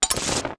World Map On

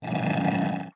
Wolf Growl; Wolf Link

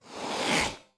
Wolf Stretch; Wolf Link

